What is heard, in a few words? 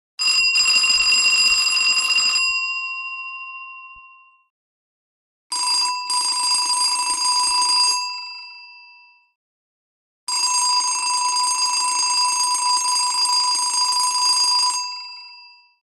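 Door Buzzer Household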